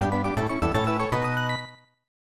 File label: Birdie; Harukanaru Augusta 2; SNES